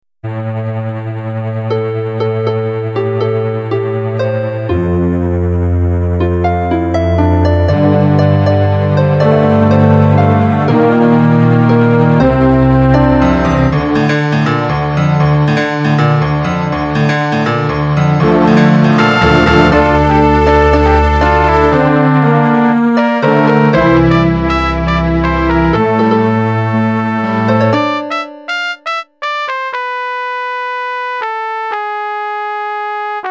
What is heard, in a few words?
normal battle orchestral